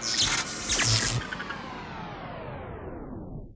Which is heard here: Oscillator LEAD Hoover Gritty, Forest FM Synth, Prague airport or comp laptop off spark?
comp laptop off spark